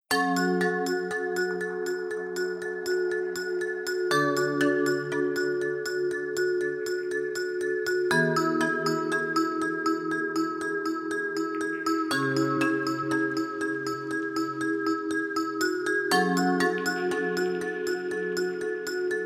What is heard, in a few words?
african chillout, 432 hz; touch of africa; Afrykański chillout